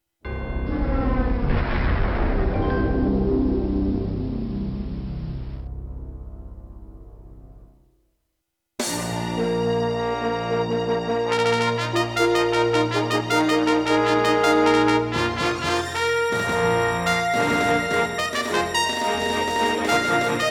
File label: Soundscape; Soundscape Elite; Dark Forces